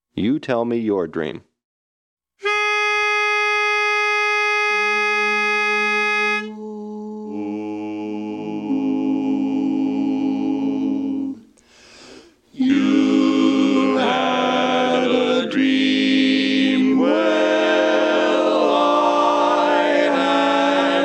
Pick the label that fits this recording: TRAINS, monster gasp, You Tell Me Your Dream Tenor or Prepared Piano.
You Tell Me Your Dream Tenor